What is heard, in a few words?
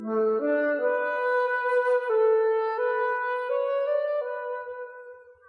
flute